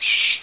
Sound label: monster death